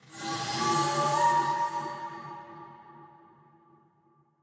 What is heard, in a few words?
near Win; temple of nudges